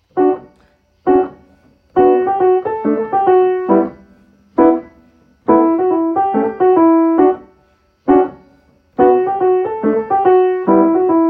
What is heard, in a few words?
Rhyme time, Piano